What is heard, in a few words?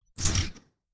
power surge